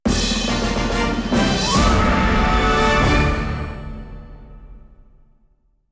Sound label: Jingle Pvp Win; dragonfangz 3ds ps4 switch xbox gamerip 2017